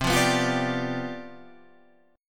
C Major 7th Suspended 2nd Suspended 4th, Standard, chord; strummed; CM7sus2sus4; 8,8,9,10,8,10